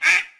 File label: duck